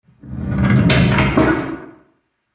Fantasy Dungeon Sliding Movement, sliding door sound effect; Sliding Door Sound Effects